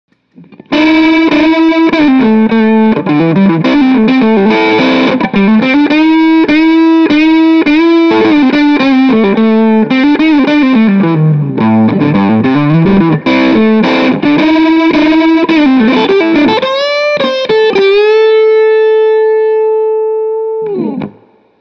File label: Heavy Tight